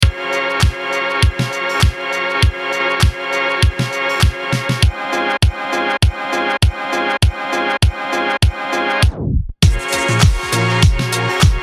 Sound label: chillwave, loops; logic pro